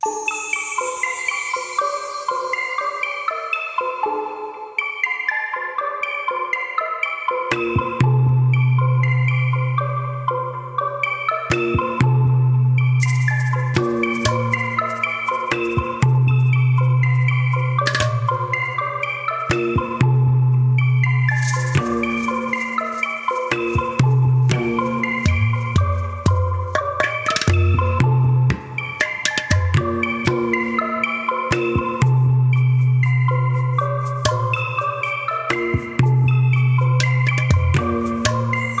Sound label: cave Song MUSIC intro; Castle on the Coast; PS4